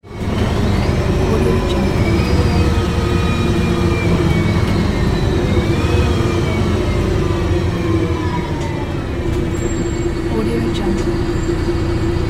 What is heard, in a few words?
city bus stops